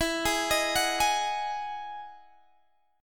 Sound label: E Major 9th E down Guitar Open D